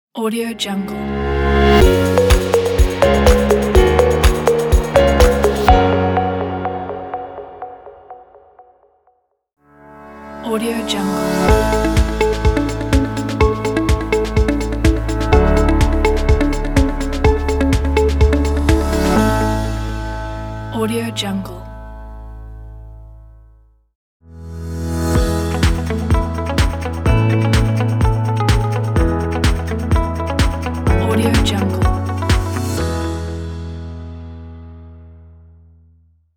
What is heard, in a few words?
corporate logo pack